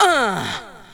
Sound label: VOX SHORTS; Megabass Remix! 2